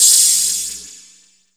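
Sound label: 127 drum 2 R, Fresh Disco House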